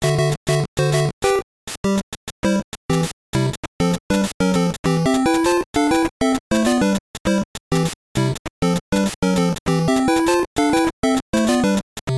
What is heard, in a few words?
Overworld Theme; SMBDX